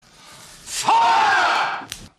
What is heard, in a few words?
men yelling fire